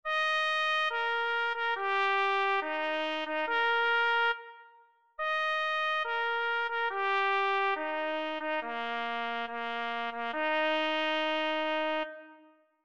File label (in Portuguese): TOQUE SILENCIO clarim